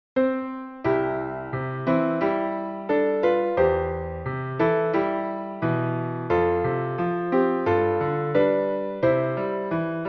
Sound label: piano; kouka